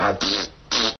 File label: Fart sound